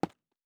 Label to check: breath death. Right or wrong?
Wrong